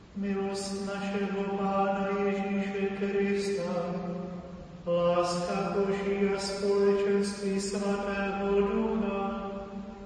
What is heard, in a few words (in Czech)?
pozdrav milost choral